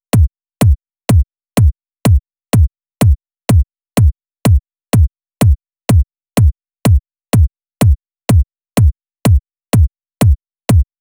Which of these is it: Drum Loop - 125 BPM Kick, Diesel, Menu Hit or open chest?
Drum Loop - 125 BPM Kick